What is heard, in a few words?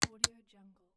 gamepad controller arrow button